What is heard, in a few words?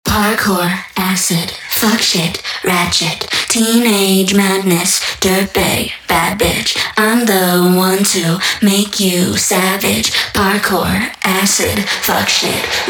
Acapella Vocal